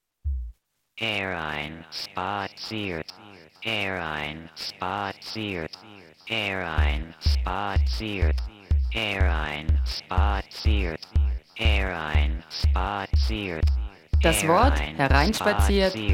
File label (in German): sprache orch jazz